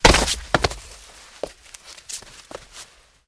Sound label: Body Falls On Cement